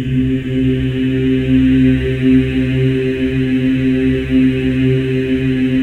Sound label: C3, Symphony of Voices men; MEN EES OCT